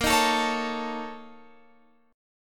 Bb Minor Major 7th Double Flat 5th down Guitar Open F; BbmM7bb5; strummed; JGuitar